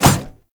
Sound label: Baby Step; Warframe; Nokko Colony; Visions